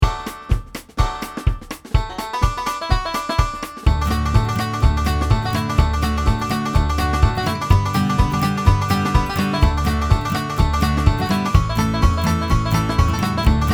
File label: Banjo, On Hold Messages; Professionally recorded phone messages; movox